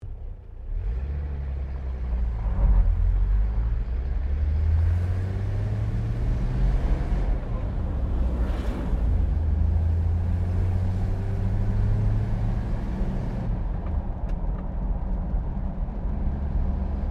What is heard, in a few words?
CAR HOLDEN FJ ACCELERATION FROM IDLE THROUGH GEARS, Classic Australian cars